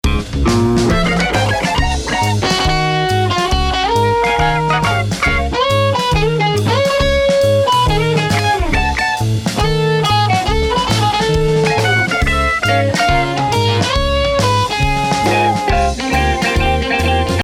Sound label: Solo